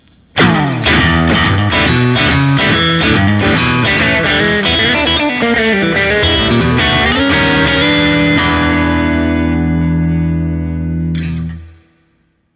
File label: 1952 middle